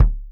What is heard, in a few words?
KICK, Salsa Kit; Drums&Percussion; E-MU Producer Series Vol. 7 – Old World Instruments